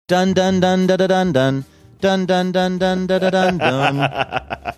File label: singing bassline